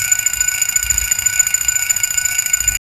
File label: looping sound of an elect, laboratory